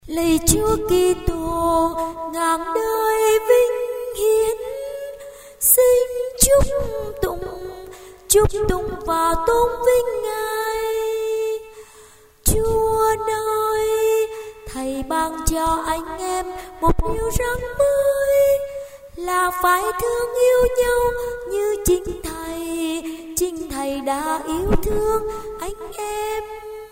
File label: soprano tenor